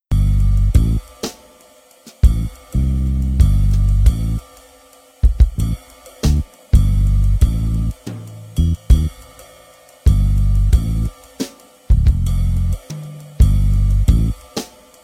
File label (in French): musique du matin pluvieux